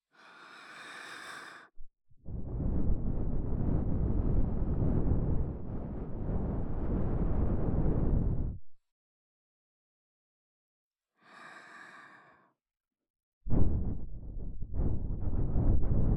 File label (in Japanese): 【左】耳ふー, ASMR; 耳かき小分けトラック